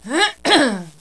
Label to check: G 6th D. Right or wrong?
Wrong